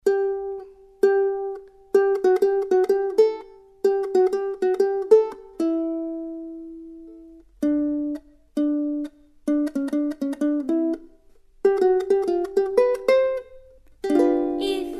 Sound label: ukulele; Hawaiian Ukulele album